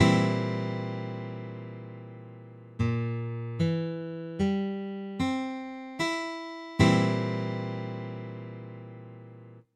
C Bb, Guitar Chords

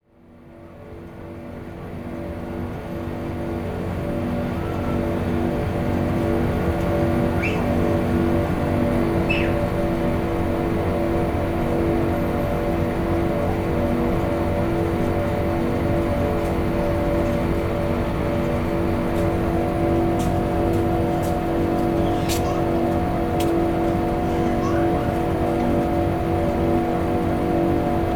Water station, Thailand; Les Cartes Postales Sonores; NOISE ON EARTH Vol3

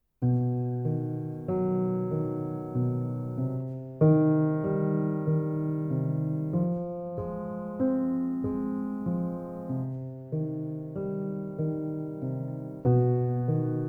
Kestrel, Piano Preludes

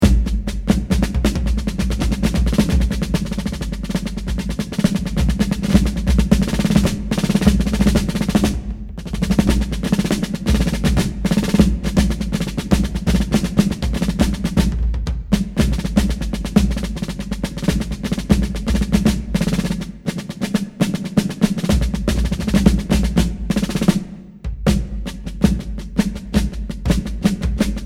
Drum Feature, Fife and Drum Corps; The United States Army Old Guard Fife and Drum Corps

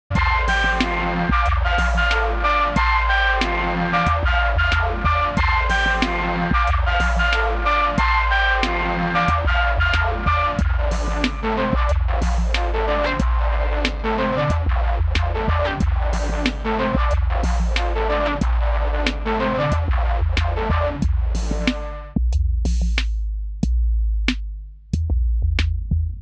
video synth pads

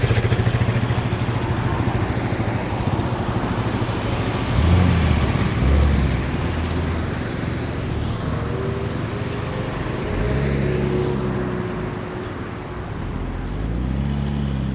Meda Milano Ambience Ext Italy Traffic; Phonography; Rondo Bennet; Emanuele Costantini; Phonography Compendium 2

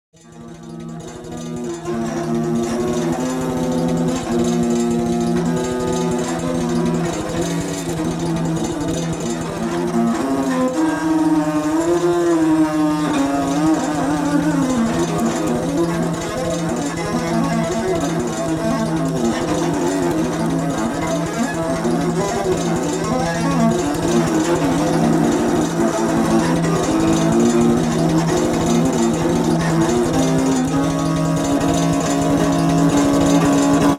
The 7 String Viola Mobile; At Waters Gallery

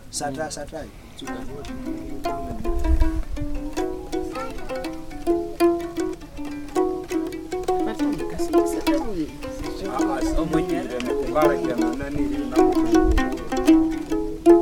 Inanga solo, Southern Uganda Rwenzori Mountains; Traditional Musicians

